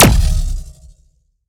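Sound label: Sci weapon Shot Medium Blaster Pulse Zapper; Robobeat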